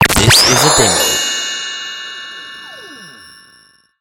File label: Lazer Electro Chimes; Xmas